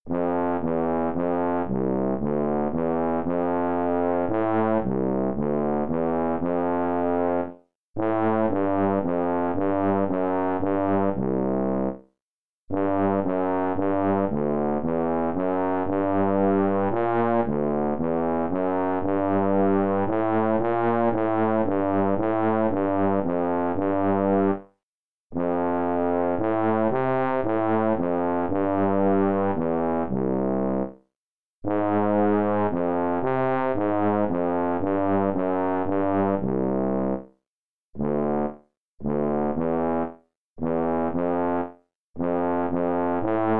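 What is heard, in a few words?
Bass Trombone slow, etudes; BASS TROMBONE Lyrical; Region 23 Middle School Etudes for 2026-27 (Year C)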